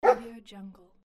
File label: dog bark